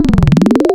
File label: Bode Sweep, wavetables; Animoog